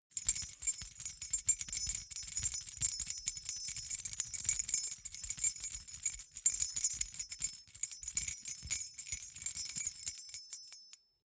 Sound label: key ring small rattle air sequence